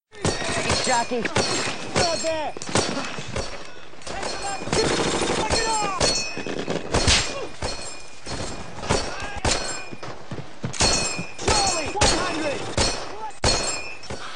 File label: M1 Garand Ping; ringtone; Hiphop